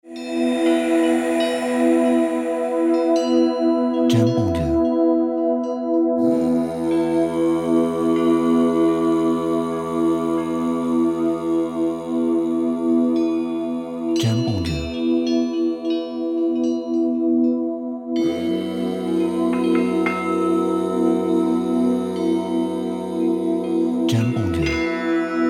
Meditation Sitar Yoga

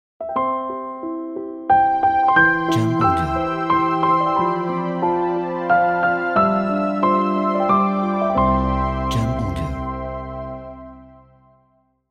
Happy Birthday Ident

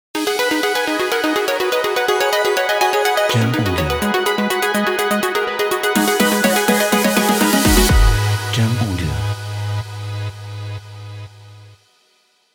Energetic EDM Logo

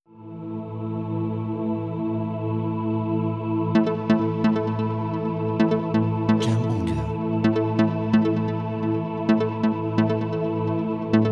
Ambient Background Relaxation

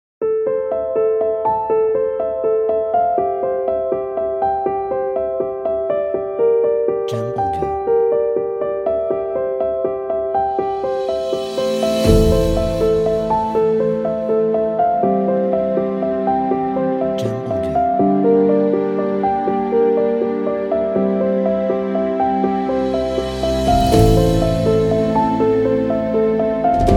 Emotional Cinematic

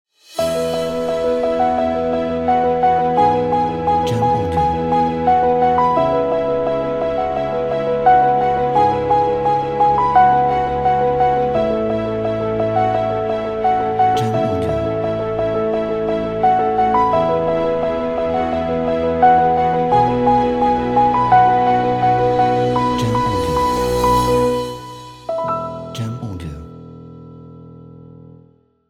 Inspirational Piano

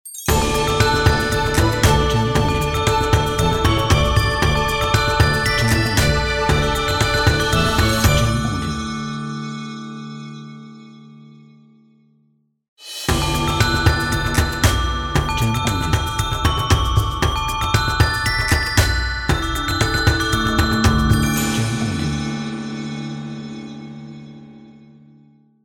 Asian Logo; Asian Logo Pack

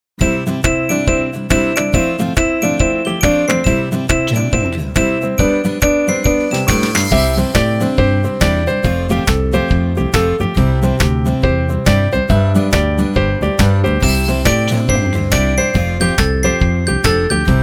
Positive Background

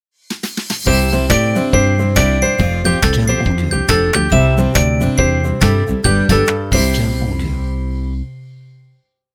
Upbeat Optimistic Positive Logo

